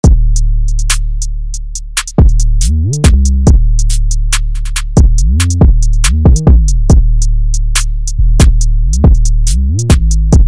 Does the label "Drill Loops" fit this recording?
Yes